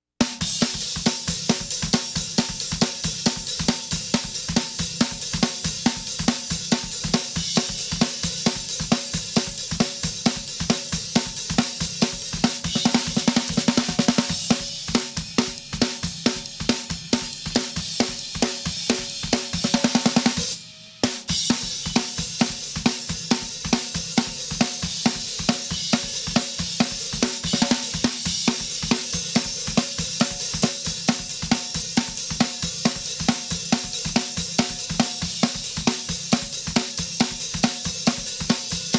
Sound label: Snare Drum; Multitrack